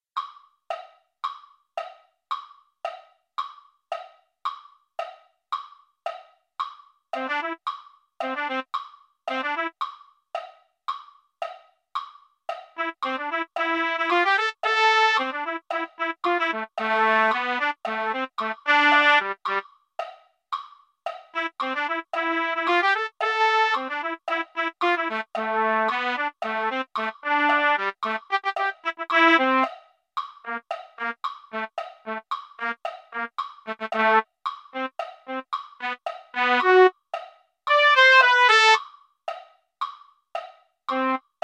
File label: trumpet; holiday brass